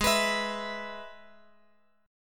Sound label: G sharp Minor Sharp 5th, chord; G#m#5 strummed; JGuitar